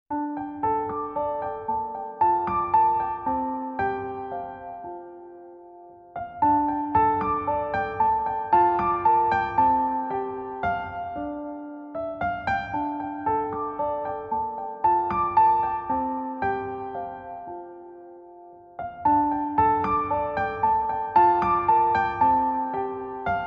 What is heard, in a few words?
Qin Flute; Legend of Fuyao